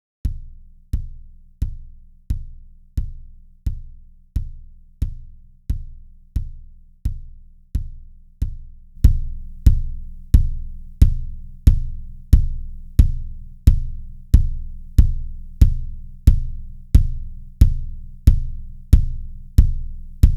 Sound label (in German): Kick Drum leise laut